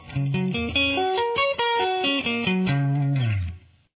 A style arpeggio, guitar

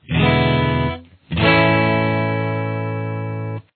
e dominant 7th resolution, guitar

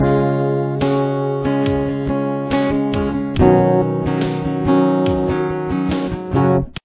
ending intro, guitar

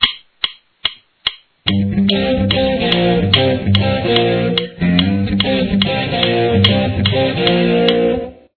guitar

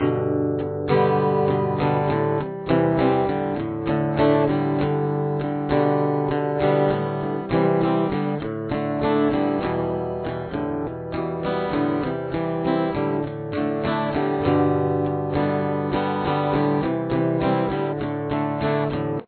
chorus, guitar

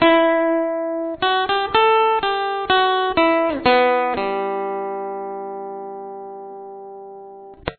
lead, guitar

